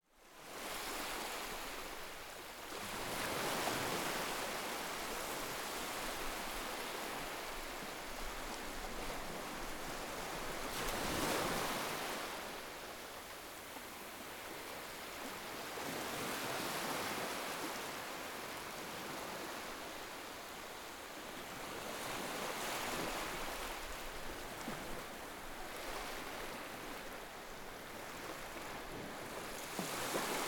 Gentle Ocean Waves Fizzing Bubbles

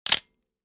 Pentax K1000 Camera Shutter

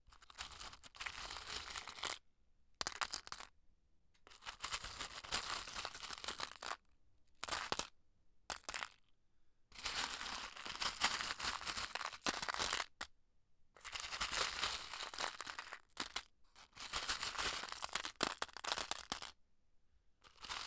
Movement Of Mosaic Tiles In A Plastic Container

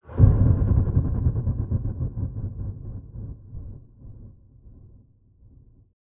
Cinematic Boom Impact Drama

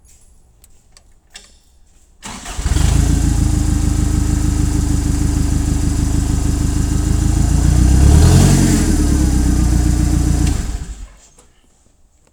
Forklift Starting

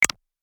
Button Click

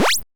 Counting Pinball Bonus Blip

